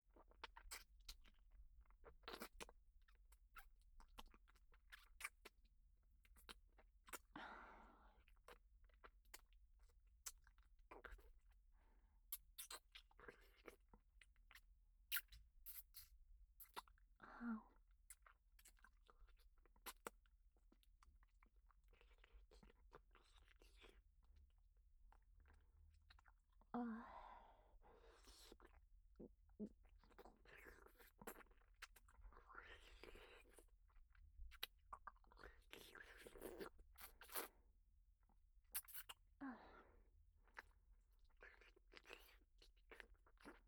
乳首舐め、キス

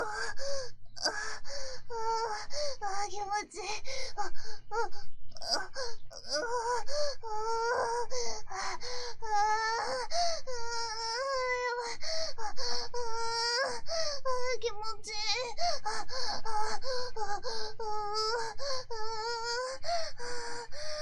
オホ声, ピストンディルドでオナニー; おちんちんフェラでピストンディルド余計に感じちゃうッ〜お口まんこにザーメンいっぱい出してッ〜

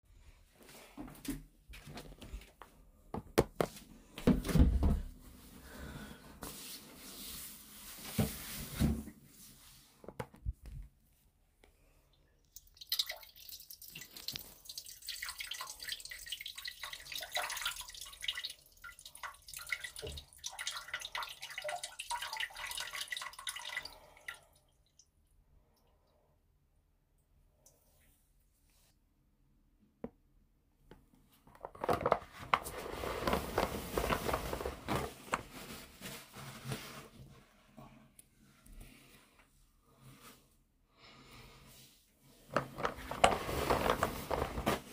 おトイレで！オシッコですッ！

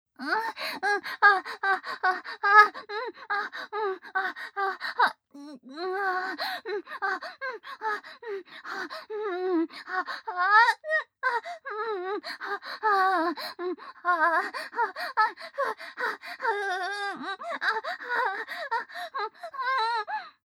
正面近い・喘ぎ声・普通; ミーシャ

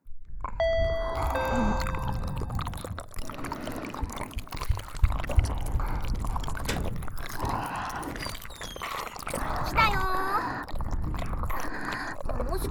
4人同時に耳舐めをされて、騎乗位とバックで中出しする音声, ASMR; 13分間

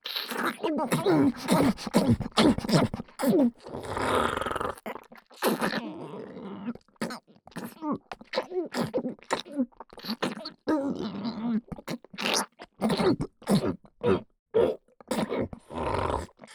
ダウナー 喉奥左耳フェラ, ASMR; フェラ素材

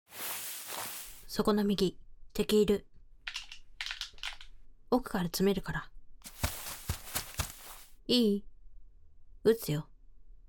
耳舐め; ここをこんなにおっきくしてるクセに…

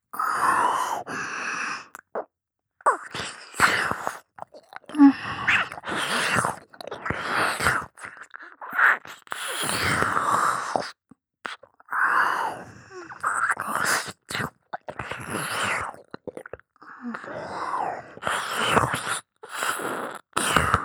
耳舐め（激しい）

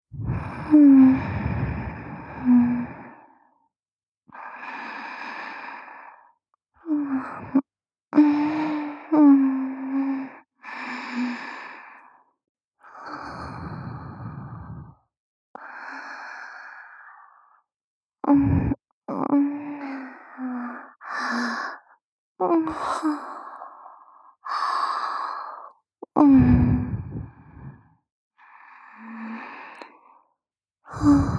「サキュバスな新妻の寝息(右)」, ASMR; SE有り

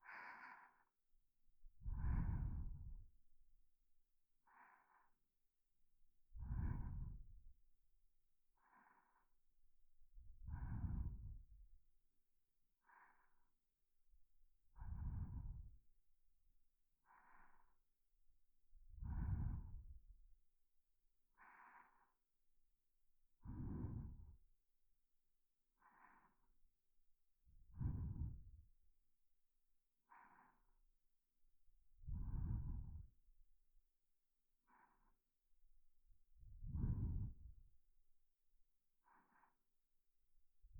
寝息; SEあり

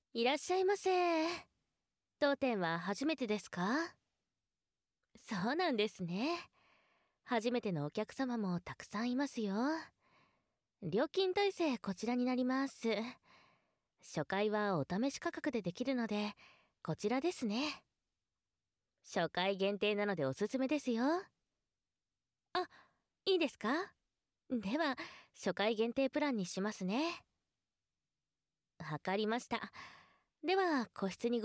乳首マッサージ; 乳首マッサージ店へようこそ～乳首射精するまで帰れません～

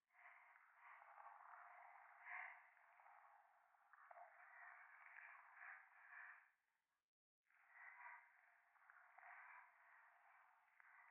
左耳 快感 吐息 触手; 夢の中

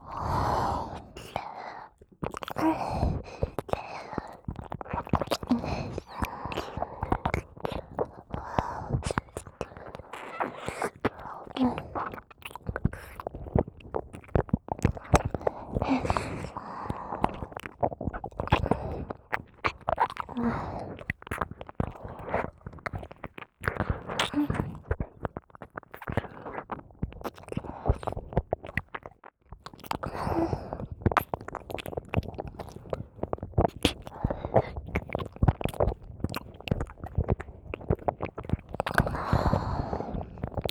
両耳舐め; おまけループ音声

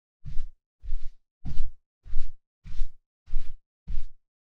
裸足 マット; 効果音

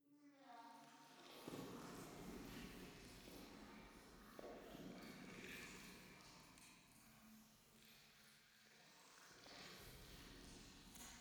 夢の中 フェラチオ 触手

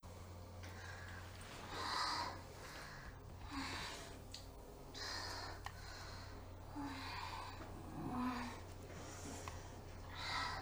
お姉さんの喘ぎ声; 毎晩隣から聞こえてくるお姉さんの喘ぎ声がチンポを刺激してくるんだが

